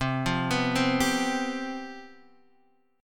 C Major 7th Suspended 4th, C, down, Guitar, DADGAD